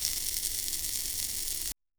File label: sizzling sound sound of cooking